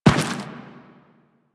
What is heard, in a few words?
Step Dirt, Footsteps; Enemies; Warframe; Teshin; DE